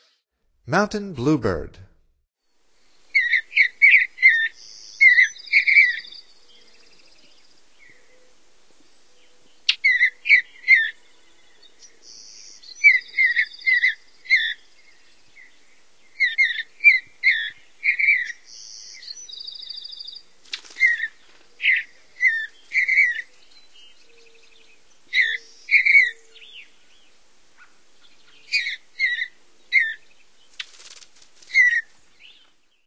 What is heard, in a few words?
Mountain Bluebird, Songs of Birds of North America; Stokes